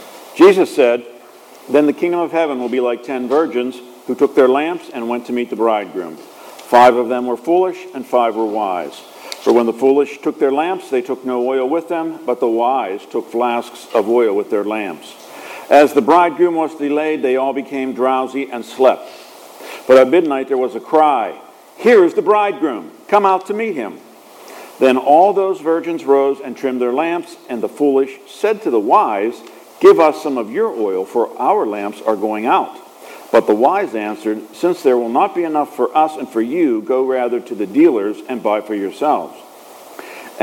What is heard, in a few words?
Gospel